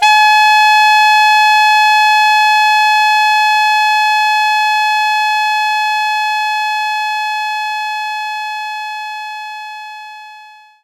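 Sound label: Alto Sax G#5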